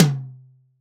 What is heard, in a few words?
tom, acoustic kit